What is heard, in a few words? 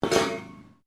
METAL PIPE FALL; Materials